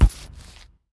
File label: shirts; RYL 2